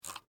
Mouse Wheel Move, Technology, Mouse, Wheel, Movement, Wireless, Laptop, Mouse; Computer; BlastWave